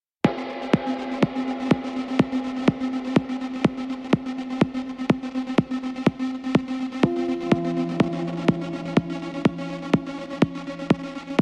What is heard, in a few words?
melodic house techno electronica; eversummer podcast